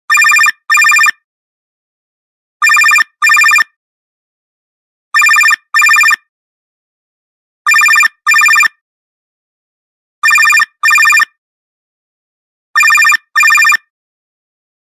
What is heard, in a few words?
office phone ringtone